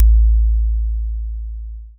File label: cinematic hit